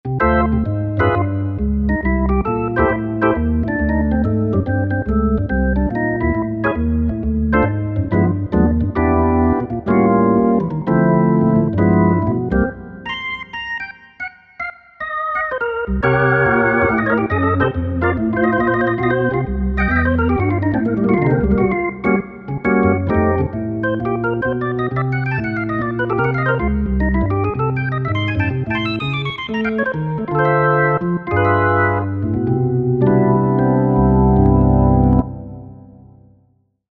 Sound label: Soft Perky